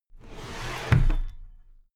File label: drawer cabinet paper close heavy